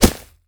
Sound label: Bullet Impact Grass